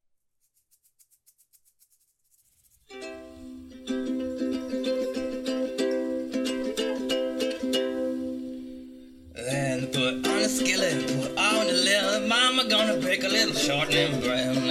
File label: Shortnin' Bread Ukulele